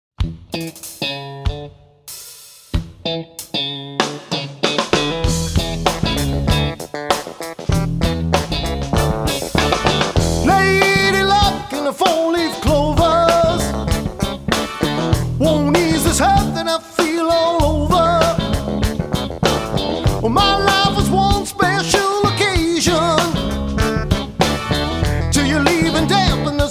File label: cover band